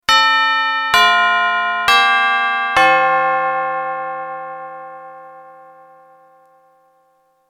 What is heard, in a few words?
Westminster chimes doorbell